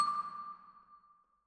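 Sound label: Vibraphone Close, Jazz for piano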